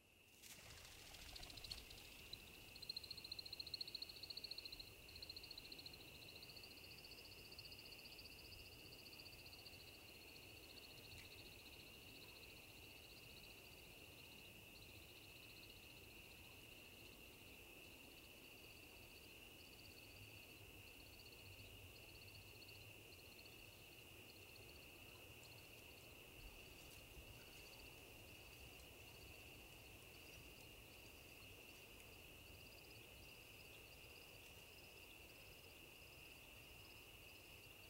Woodcock Am Flight

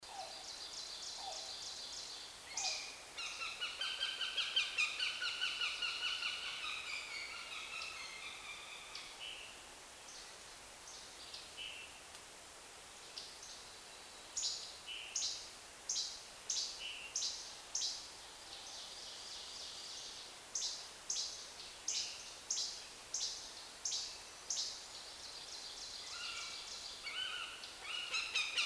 Goshawk Alarm

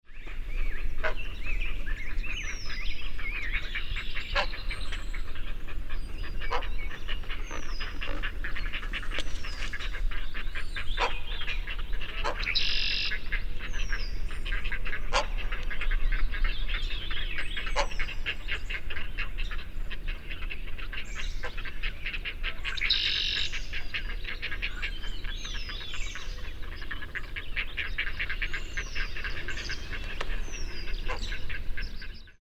Heron Gr Blue Squawks

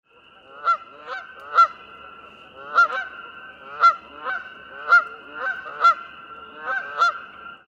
Goose C Alarmed

